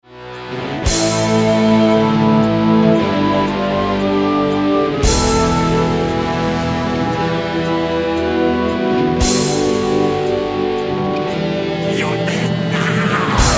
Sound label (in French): Guit Elec solo